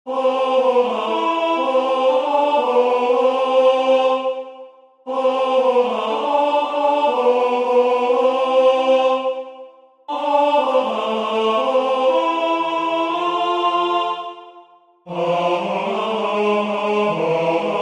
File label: tenor; Waiheke Island Choral Society